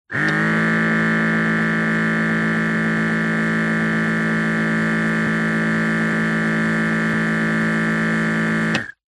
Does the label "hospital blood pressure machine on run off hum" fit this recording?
Yes